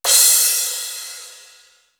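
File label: LOW CRASH, cymbal; CYMB.HH; East West; Global Sounds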